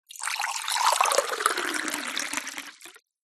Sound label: food drink pour water into mug from kettle fast